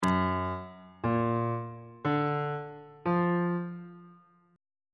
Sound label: e flat harmonic minor 7th chord 3 is g flat augmented major 7th on bass clef